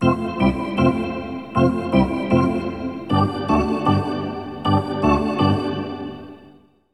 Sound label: Hockey Organ